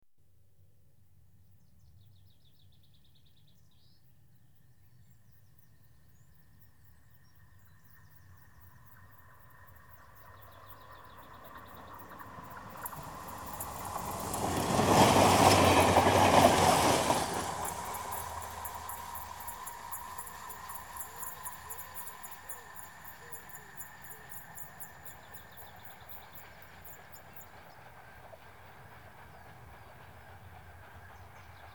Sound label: Trains Passing Single diesel; The BBC Sound Effects Library